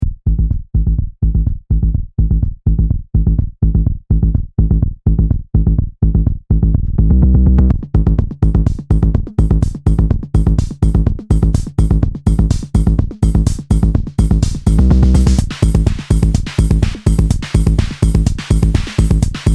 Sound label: BD Filter